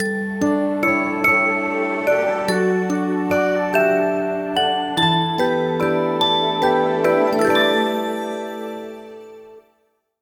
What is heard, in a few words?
Save - Sleep Jingle Alternate; Snacko